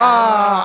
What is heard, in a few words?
"ah"